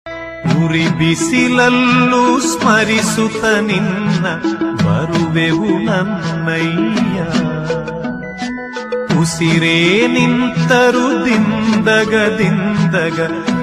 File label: Ayyappa Swamy Kannada Ringtone